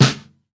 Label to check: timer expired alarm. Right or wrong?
Wrong